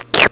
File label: eat; DinningPhilosophers